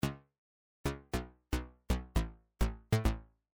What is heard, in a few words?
bass